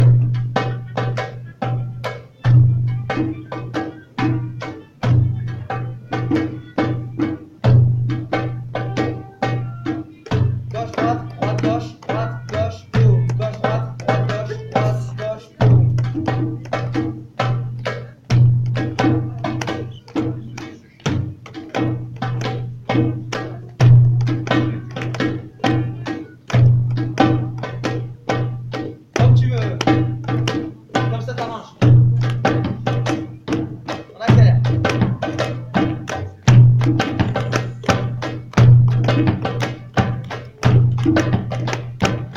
rythme percu; Mazurka; Musique d-ensemble

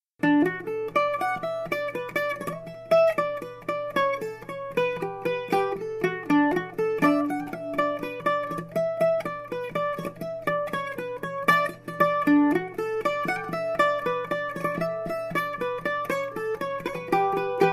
Fandango rapide; atelier danse

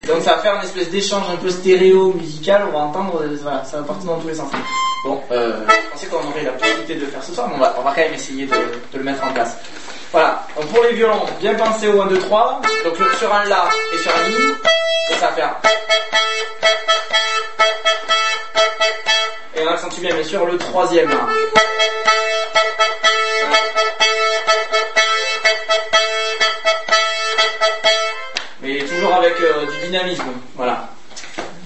Polka Provencale (rythmique violons)

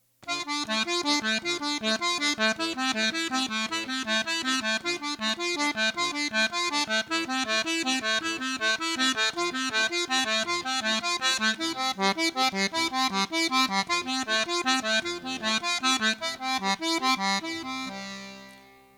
arpeges simples

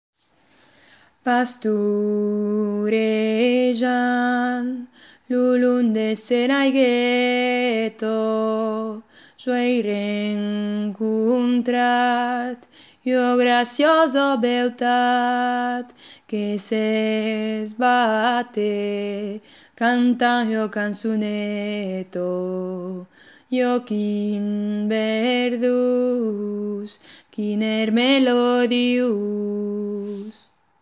Pastourejant voix; Ateliers chant

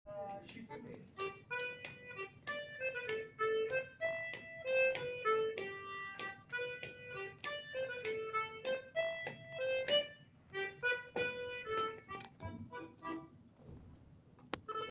flutes; enchaint rondes; Ronde des chasseurs; Musique d-ensemble

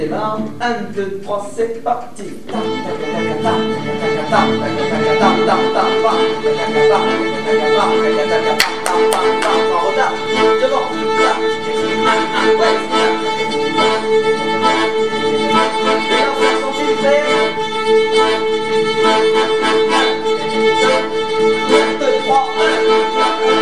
enchainement intro et bourrée; Enchainement intro et mélodie

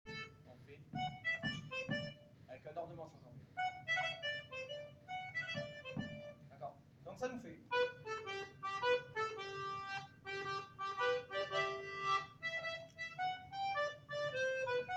variation ornement, Mazurka

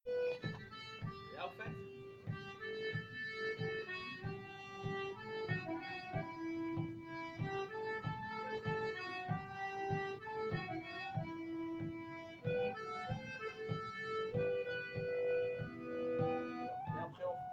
voix 2; Ederlezi

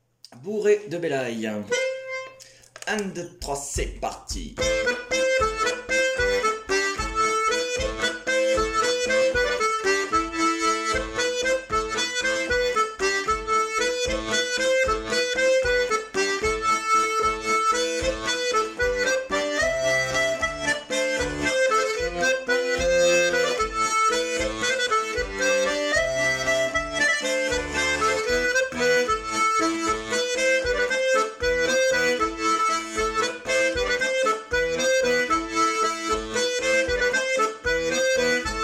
bourree; Bourrée de Bélaye (Quercy)

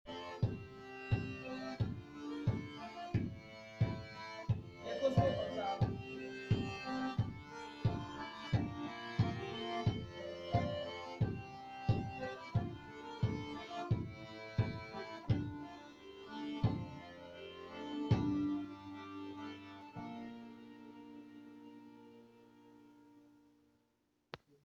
avec bourdon

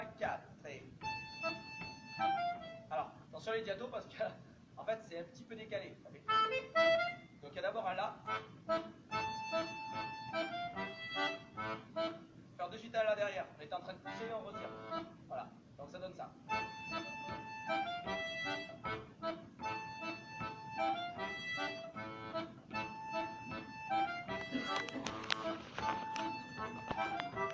Andro Voix 2 Diatos; Nouvel Andro; Musique d-ensemble

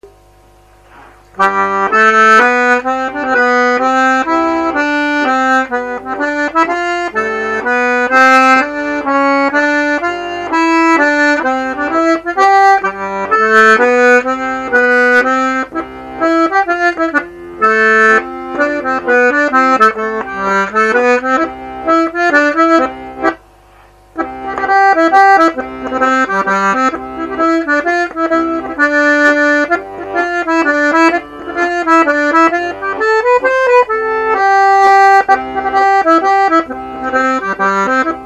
MAZ ARAGONAISE; rencontres-2013

